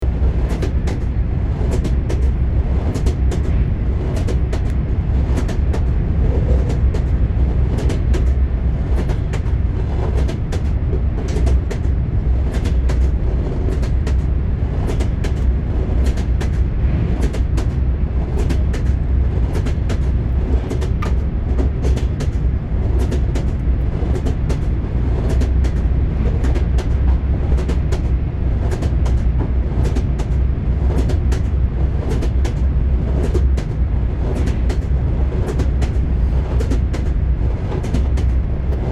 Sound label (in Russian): zvuk stuka koles poezda